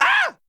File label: Scream; EDDY; Ed Edd n Eddy - Adromulus Pack; EEnE